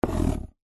office handwriting pencil draw line on cardboard box